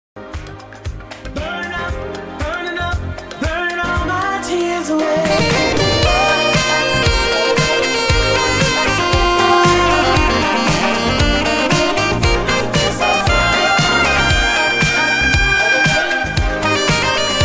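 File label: Sax, saksofon na wesele; wesele Krakow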